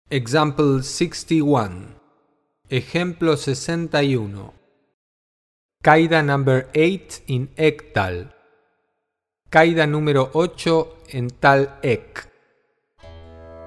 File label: Tabla; Tabla for All